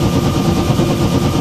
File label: turbine, ambient; Source Engine; tf